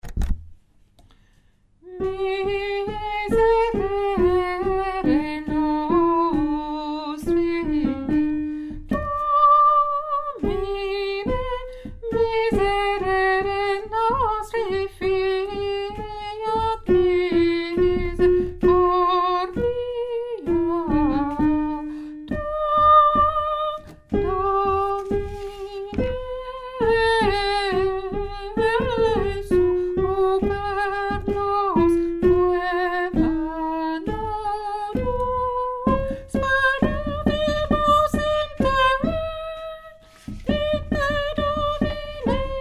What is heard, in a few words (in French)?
te deum tenor, chorale